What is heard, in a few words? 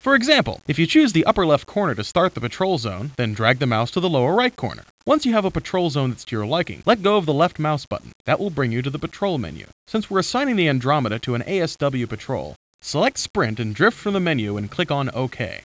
HARP